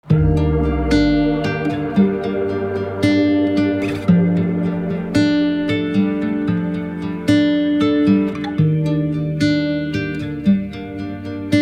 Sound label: instrumental, persian